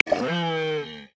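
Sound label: Musk Ox, Polar Region; Xiaomi Redmi-Note-8-Pro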